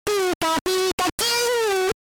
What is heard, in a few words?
Pikachu SFX; RBY